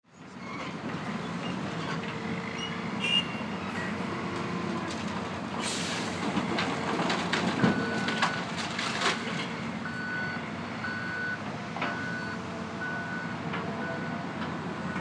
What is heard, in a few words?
construction